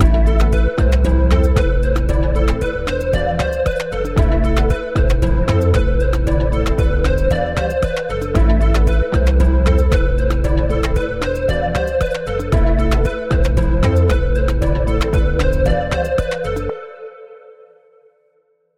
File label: video game beat